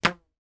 rubber band twang; foley

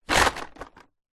plastic hollow large fall over drop; foley

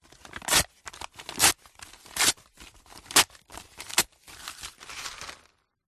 ripping a sheet of paper into small pieces; Sound Ex Machina

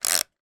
household timer plug wind

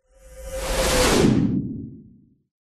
magic spell whoosh powerful energy pass electric

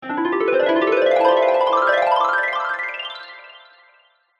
sound design heavenly dreamy glissando

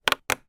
household alarm clock large snooze button press; zapsplat

